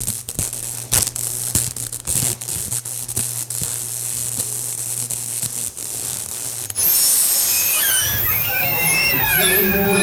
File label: harsh electrical arc buzzing and whining; tools